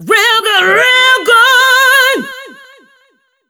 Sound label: Funky Vocals; USB Soundscan vol.18; AKAI